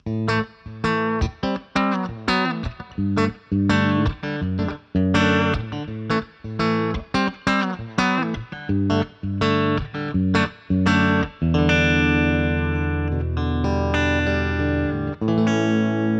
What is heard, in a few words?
Squier Clean Bridge PU